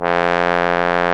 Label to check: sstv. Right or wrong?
Wrong